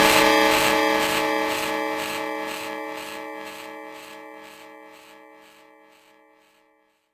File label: Cymbal